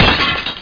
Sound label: broken, house